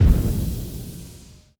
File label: Death Explode; gamerip; Ghost Dialogue